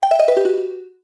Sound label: Picto Box Erase; Erase a snapshot (Recorded)